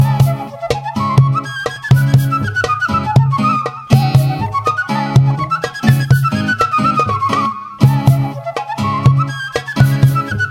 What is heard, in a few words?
flute tandav